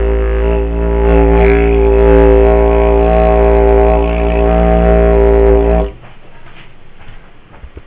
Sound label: didgeridoo drone